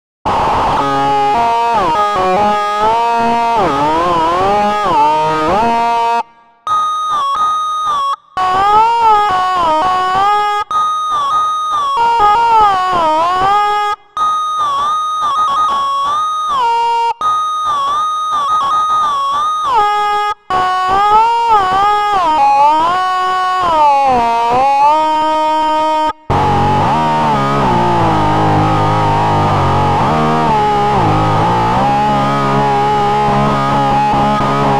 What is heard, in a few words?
lead